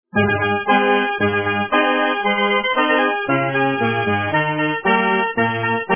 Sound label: Accordion; Inventron